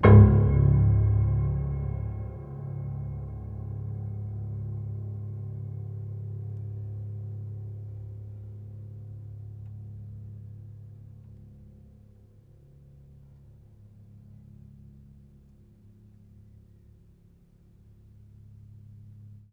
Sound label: Hamm Perc Ped, Piano Hammer Perc Ped; Piano Miscellaneous